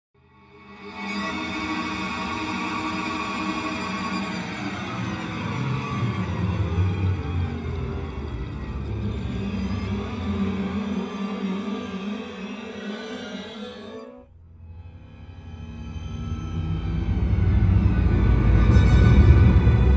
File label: Sinister string fx drones